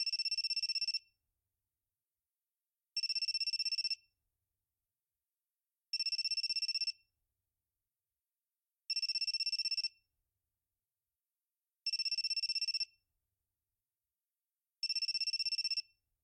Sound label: Ringtone; LOOPERS